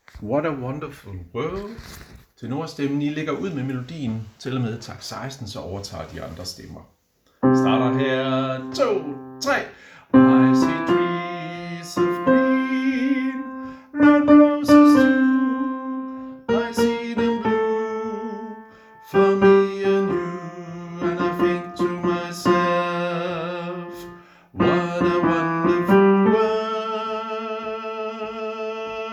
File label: tenor; harlev koret